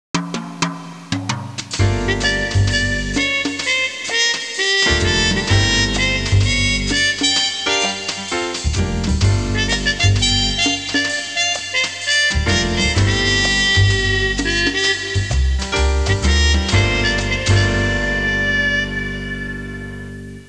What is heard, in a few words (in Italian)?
suonerie musica jazz